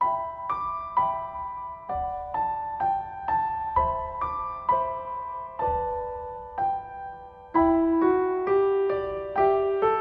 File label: Sad Doll